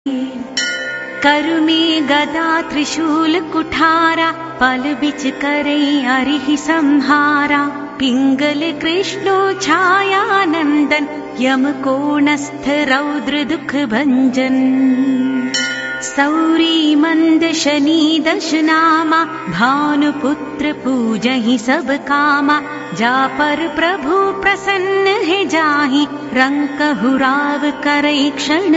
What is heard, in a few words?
shani chalisa; deities